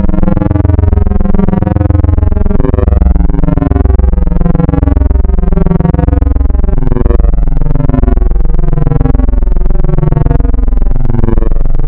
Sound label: Spectral, wavetables; Architecture 32